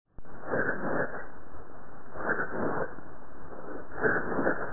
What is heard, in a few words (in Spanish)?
Egofonia